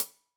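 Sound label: Sab Closed Hat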